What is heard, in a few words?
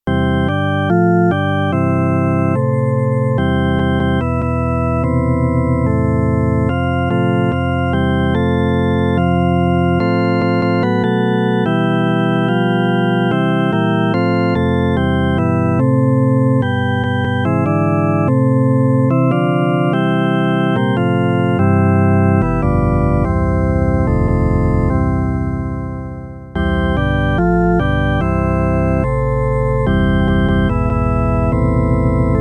This Little Light of Mine Piano & Flute